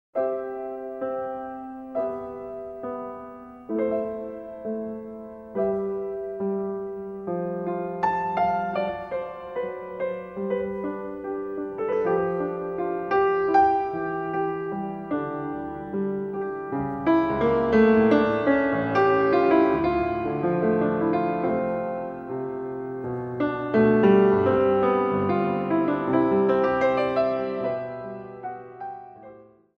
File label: Piano